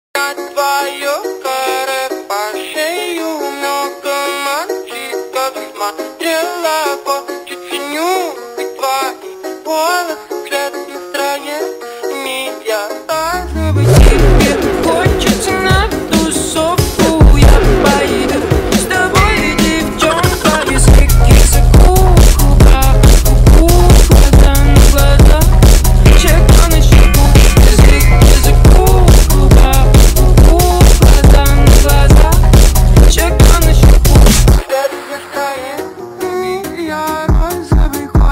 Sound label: speed up hardstyle remix